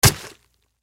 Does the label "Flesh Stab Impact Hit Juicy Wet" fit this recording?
Yes